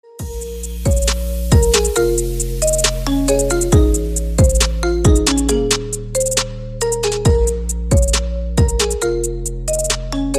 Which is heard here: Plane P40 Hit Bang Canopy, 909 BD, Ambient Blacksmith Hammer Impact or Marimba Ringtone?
Marimba Ringtone